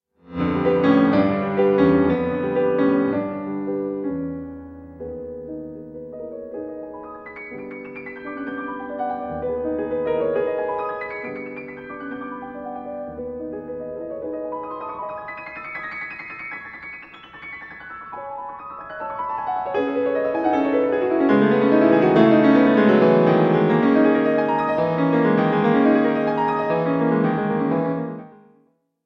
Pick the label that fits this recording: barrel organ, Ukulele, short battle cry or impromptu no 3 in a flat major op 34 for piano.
impromptu no 3 in a flat major op 34 for piano